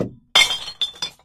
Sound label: amethyst break; Minetest; VoxeLibre